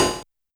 Metal Noise; YAMAHA RM1X

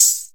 percussion, Ethnic Perc

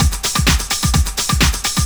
Latin Loop 128 BPM; House; Best Service; House Definition VOL-1

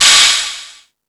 Found Sound, Drum Machines

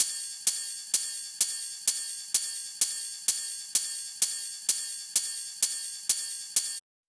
Drum Loop 128BPM Ride; VEC5 Drumloops; VEC5 Multi Loops 128BPM; Loop Elements

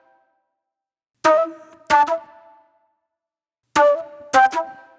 Pop Flute

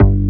BASS, Synth Bass

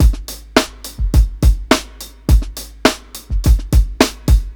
105 8TH BEAT, DRUMS LOOPS; BASEMENT DRUMS VOL 2

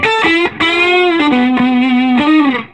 SOLO, Vintage Blues Guitar; USB Soundscan vol.22; SOLO B060

